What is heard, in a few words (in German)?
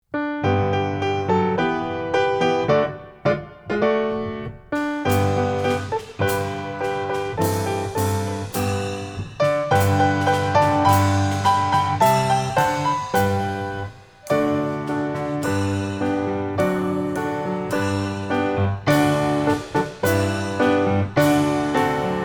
Musikanten ohne Gesang 1 Instrument